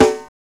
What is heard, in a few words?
SNR, Snares; AMG; Now CD-ROM (Roland); NOW! Drums